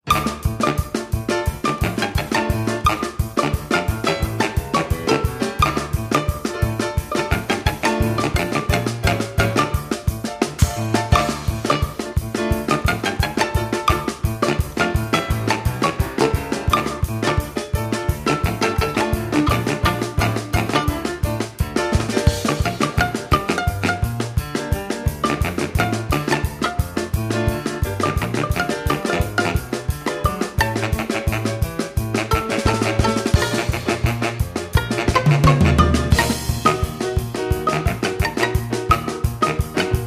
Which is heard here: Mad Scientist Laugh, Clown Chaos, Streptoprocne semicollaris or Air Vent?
Clown Chaos